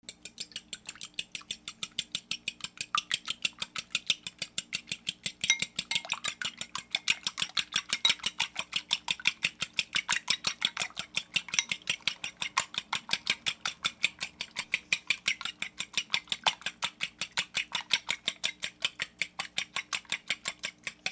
KITCHEN

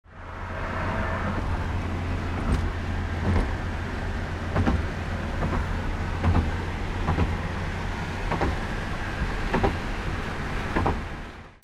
HIGHWAY

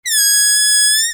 TOYS

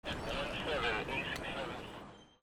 PHONES

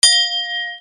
BELLS

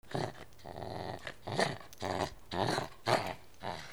DOGS

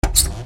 DOORS

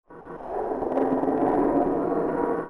SCIFI

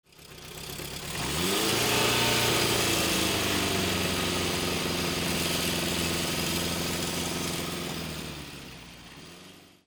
VEHICLES

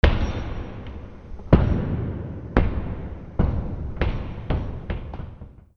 FALLING

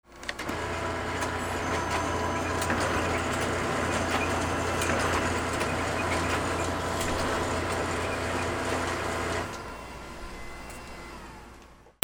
OFFICE

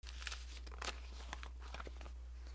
PAPER

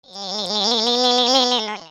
ANIMALS

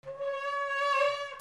HOUSE

